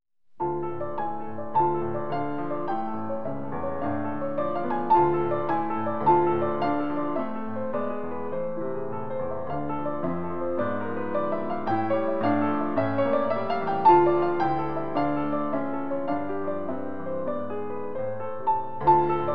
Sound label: Piano Fantasiestuck